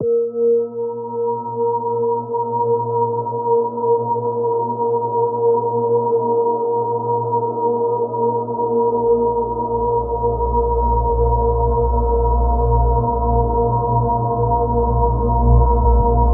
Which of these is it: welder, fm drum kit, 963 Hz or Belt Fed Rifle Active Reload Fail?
963 Hz